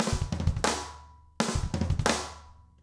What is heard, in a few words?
jungle 170 fill; Sound Effect Library